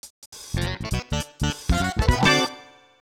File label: Rocket Belt - Landing Accomplished